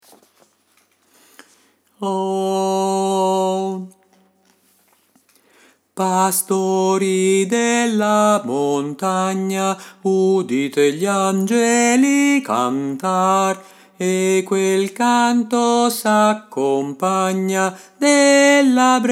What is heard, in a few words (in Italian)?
angeli nelle campagne bassi